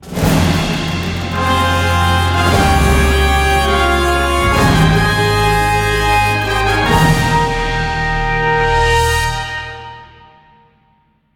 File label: Stingers; Section 8